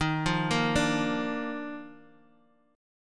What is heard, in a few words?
Eb Major 7th Suspended 2nd Eb 4G 5C 5E 5A; EbM7sus2; arpeggiated; JGuitar